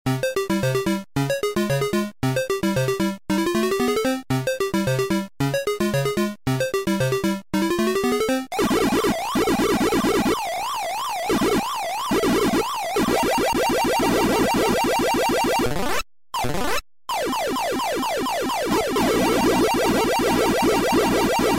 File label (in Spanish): comecocos